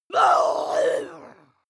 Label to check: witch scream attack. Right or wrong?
Right